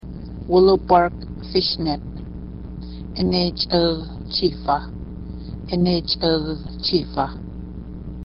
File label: willow bark fish net; Tsii; gwichin